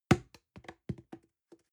plastic can small fall bounce medium; objekte; dose

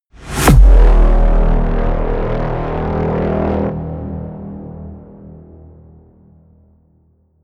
blast, hits explosionen metall

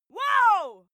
female cheer wow; schreie; menschen

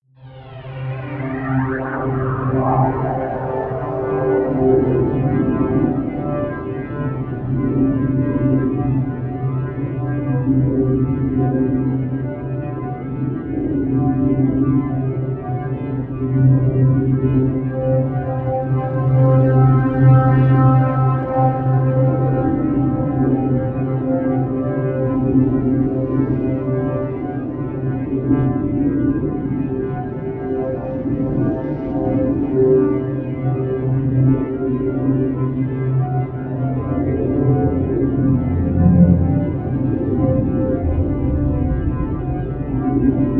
drone frantic attuning

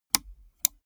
gaming mouse left click single slow; maschinen; buero

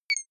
click electric pebbles tonal high; signale

